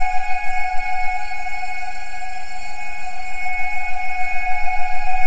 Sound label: F#4 VOXY A; NorthStar - The Wizard VOL-2